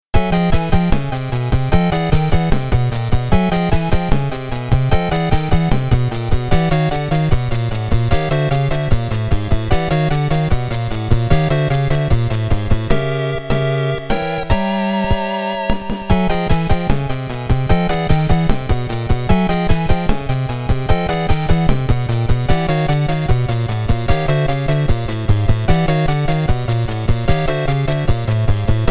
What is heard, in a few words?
Train Station Theme (Train Station BGM); Esper Dream 1 2 Sound Memorial